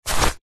wetsuit top pick up; foley; zapsplat

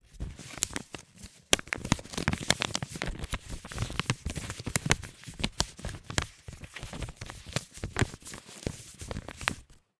foley money note 5 pounds new polymer handling

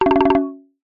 cartoon wobble flutter wood mallet style

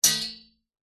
metal spring ping tight; foley; zapsplat

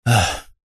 male middle aged exhale sigh moan; human; zapsplat

